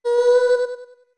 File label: Voice B med; OOT